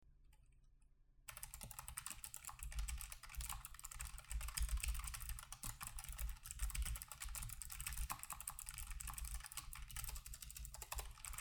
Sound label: Logitech G915